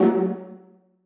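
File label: violas pizzicato f#3; Sonatina Symphonic Orchestra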